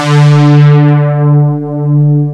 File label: PPG WAVE, OLD SYNTHS